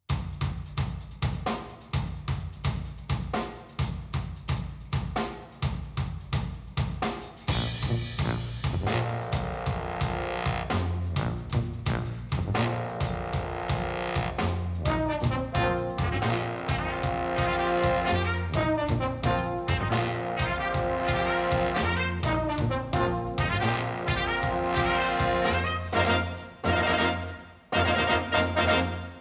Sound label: Brass Machine